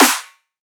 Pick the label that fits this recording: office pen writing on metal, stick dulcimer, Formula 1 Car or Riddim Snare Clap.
Riddim Snare Clap